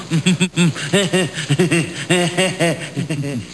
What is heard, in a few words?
LAUGH